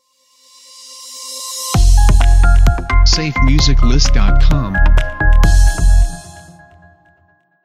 Modern Technology Logo; SafeMusicList

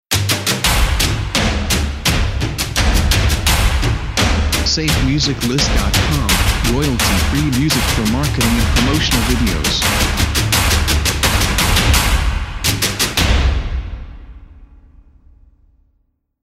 Arena Football Action Logo; SafeMusicList

